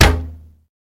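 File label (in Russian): удар по корпусу гаубицы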